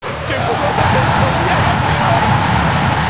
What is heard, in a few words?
wind grab; mk4; fujin